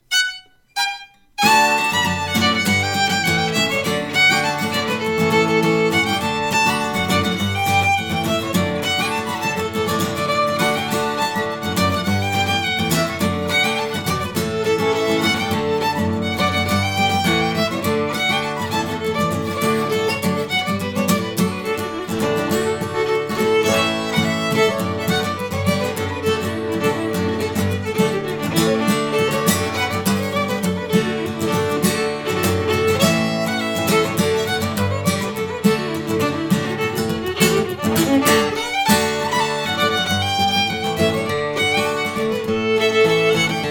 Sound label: Ti Jean Bouribale